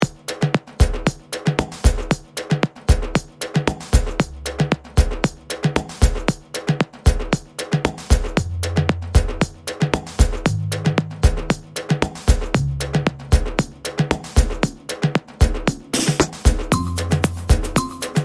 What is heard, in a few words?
Horns In The Sun Deep Claps Back